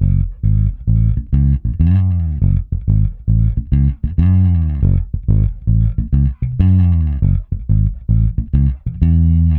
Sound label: Bass F# Funk